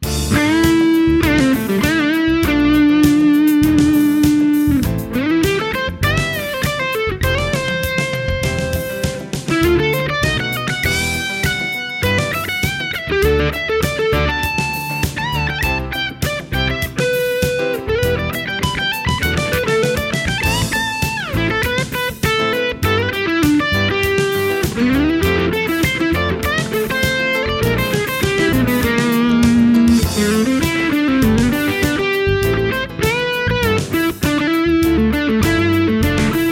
solo; gt2

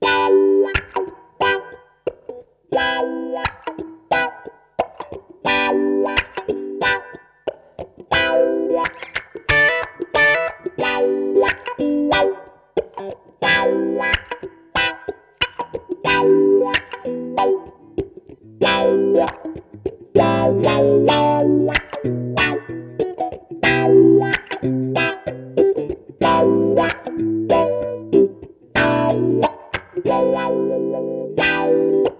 strat; rmc wizard

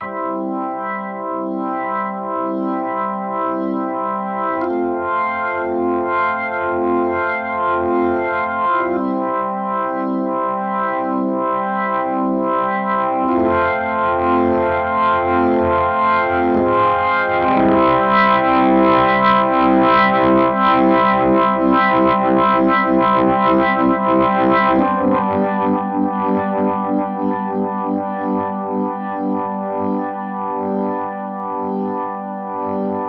rotovibe

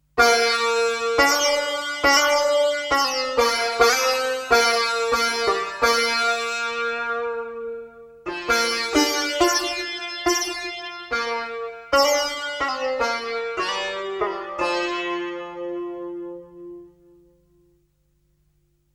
sitar; guitarsynth

